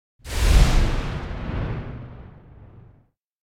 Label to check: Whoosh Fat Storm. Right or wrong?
Right